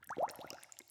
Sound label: bubbles